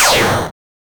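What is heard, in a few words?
a single shot bullet noise, shooter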